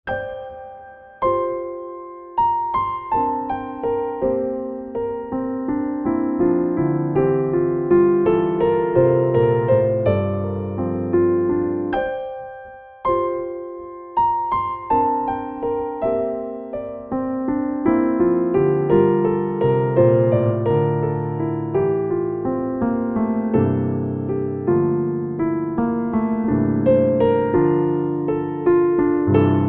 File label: impro, bosendorfer; Ermanno Novali; pianoteq8